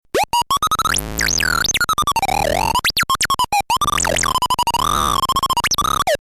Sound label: bleep tone